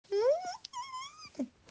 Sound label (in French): porte qui grince niaisement